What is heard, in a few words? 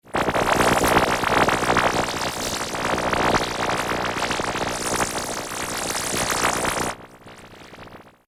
Alien Machine Scanner Analyzer Warble Shimmer; Computers; BBFX